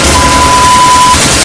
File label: jumpscare sound